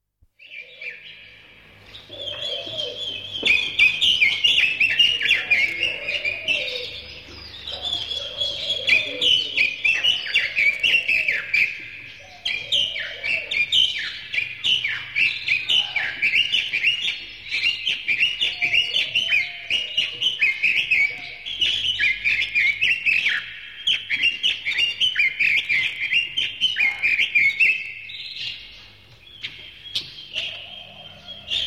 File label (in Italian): RUMORI GIUNGLA UCCELLI